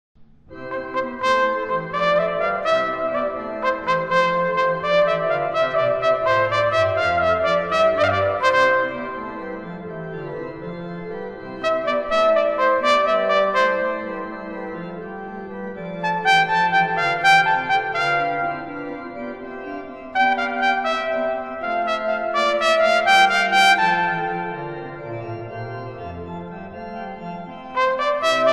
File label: Presto